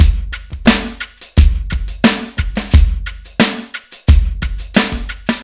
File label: Slow Fat Funk, Drums Beats